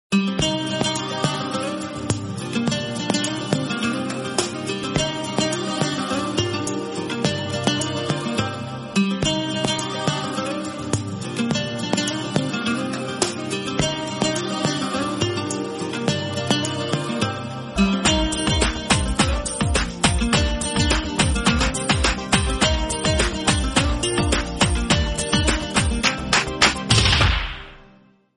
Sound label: Guitar Bgm